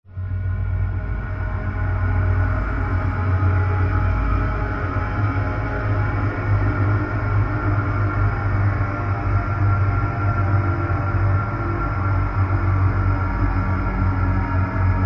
horror atmos drone tense sinister slowly descending; zapsplat